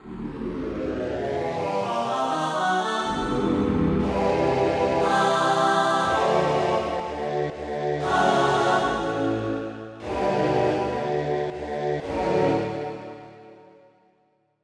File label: Vox Octave Pad; SoundFont - Traditional, Voice & Keys - Volume 2